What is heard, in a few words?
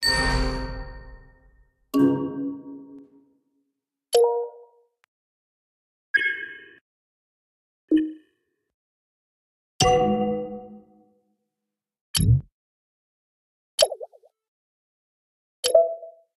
Ui Combat; Archeland